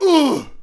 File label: Hit Vox; Civ4 warlords